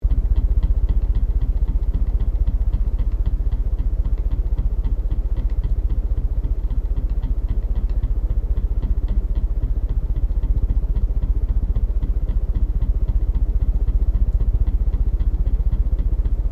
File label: FAN CEILING BROKEN BELOW LOOP